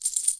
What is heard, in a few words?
collect Win; jacks or better multiple hand